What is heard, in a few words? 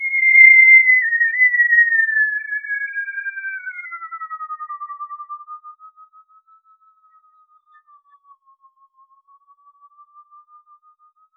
whistle 2 time